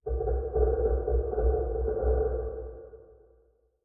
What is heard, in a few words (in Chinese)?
玄武岩三角洲重咔嗒声; ambient